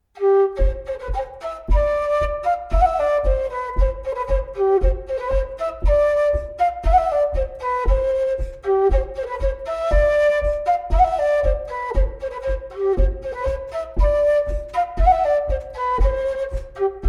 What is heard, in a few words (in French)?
Bourrée; Recueil Lemaigre